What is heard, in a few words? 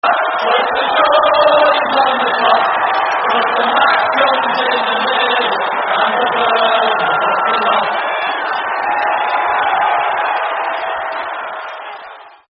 Boro Build A Bonfire No Cheer, terrace chants; Middlesbrough